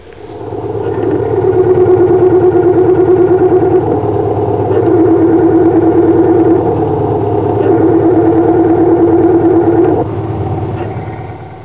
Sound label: throw out; Worn throughout bearing; Muir